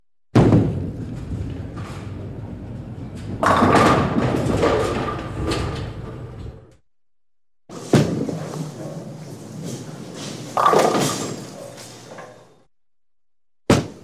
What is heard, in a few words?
bowling